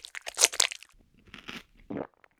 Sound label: eat meat